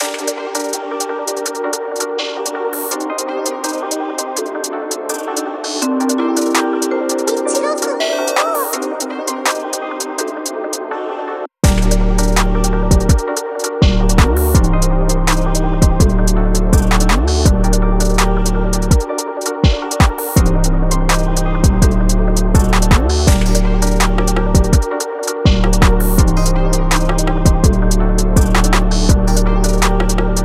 Gm BPM165

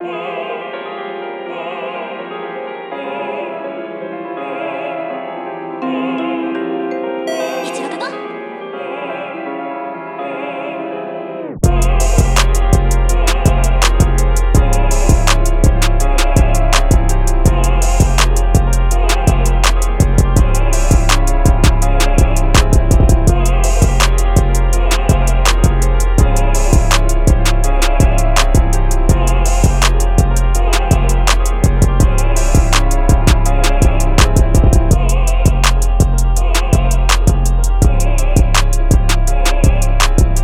Fm BPM165

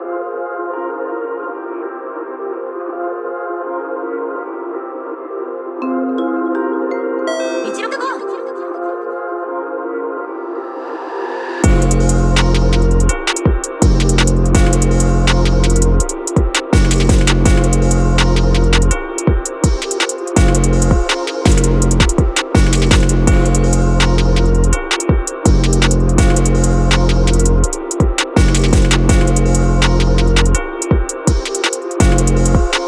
Fm BPM165

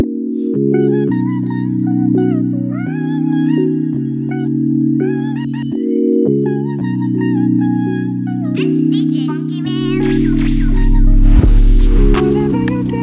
bpm168 Fmaj

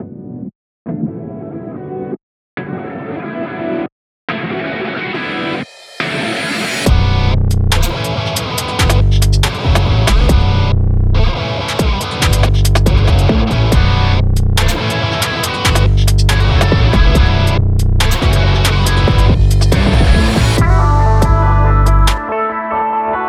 Type Beat BPM 140 B min

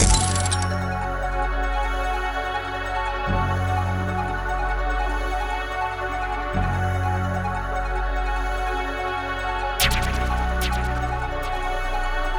147 Fmin

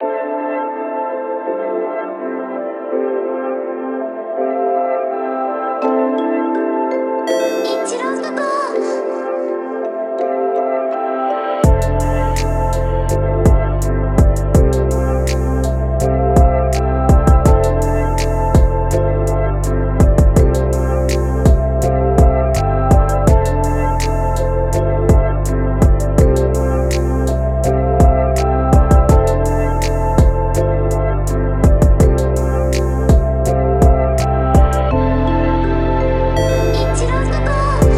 FM BPM165

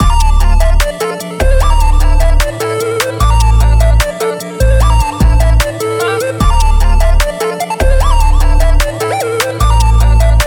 Type Beat

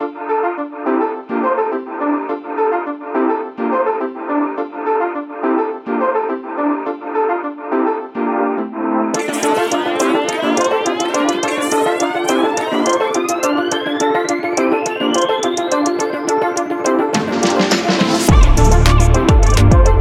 Cypher Type Beat BPM 105 D min

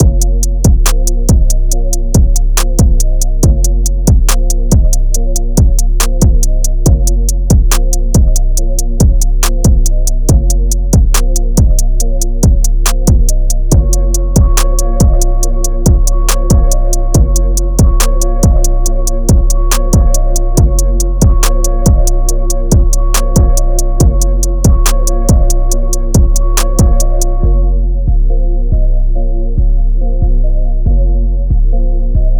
BEAT

